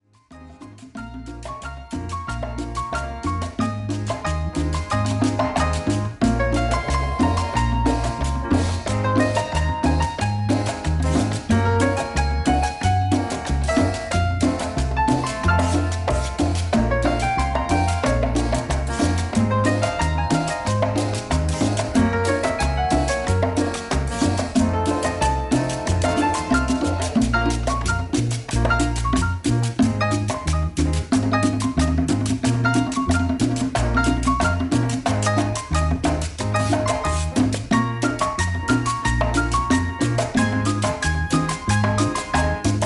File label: Cumbia